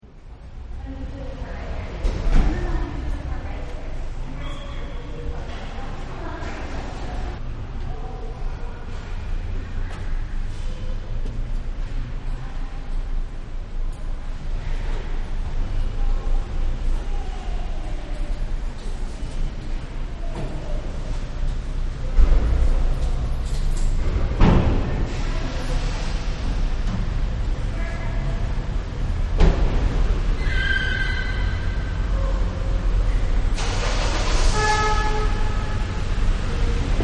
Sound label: Parking lot Busy, Shopping Center Parking Lot Ambience, Busy Traffic, Car Door Slamming; Driving Over Metal Speed Bump